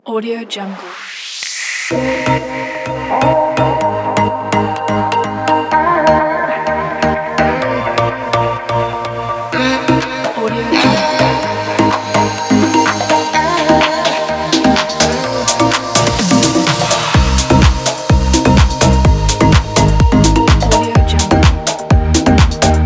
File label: deep house